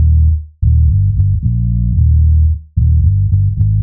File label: bass